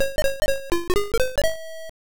Miss; Pac-Land; ZX Spectrum 128

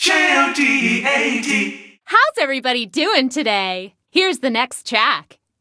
Radio Jingle; let it die; OBS

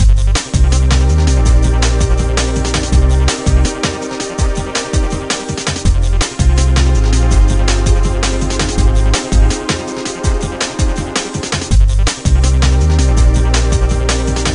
Music Race; animal world big cats dinosaurs 2009 nds gamerip

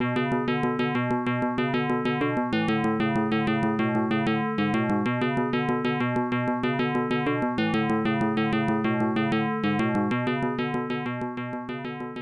Landing (Without SE); Argus; arcade

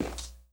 walk tile; lucius

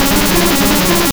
Psybeam (Loop)

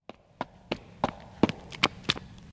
Footsteps Stone Descend Fast; The 39 Steps

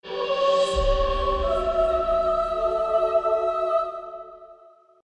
Jingle Angel Room Appear

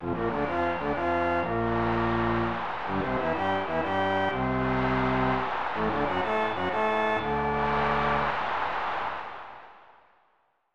Baseball Fanfare; Tecmo Super Baseball 1994 SNES

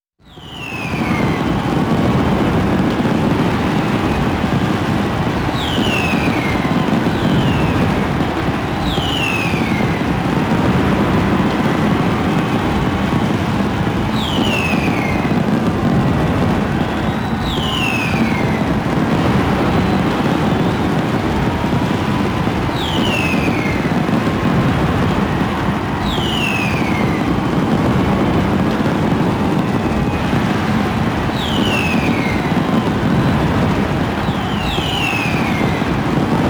Coliseum Rooftop Stage (AMBIENT); King of Fighters XIII; PS3 version

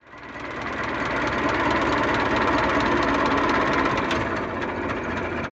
Car Ford T Stop, Idle

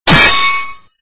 SFX sound (claw hit); Red Baron

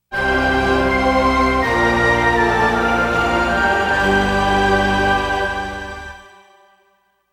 Artifact Acquisition Fanfare; Chozo

